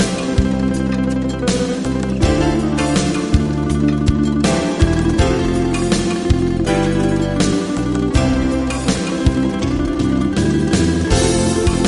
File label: Instrumental